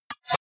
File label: hover fast hard; maschinen; signale; soundpool